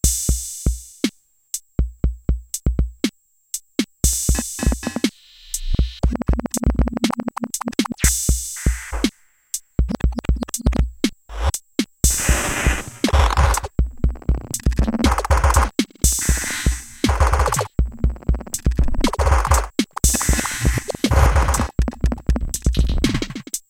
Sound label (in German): Drums mit Effekten Freeze und Glitch